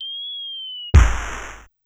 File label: Firework, Yamaha PSR-E323 SFX Kit